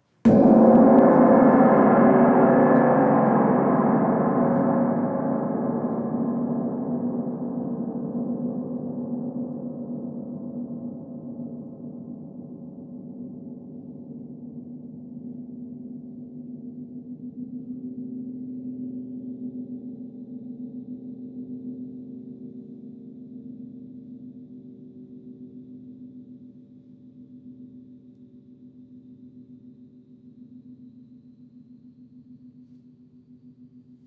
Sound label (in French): Doucement, GONG VIBRATION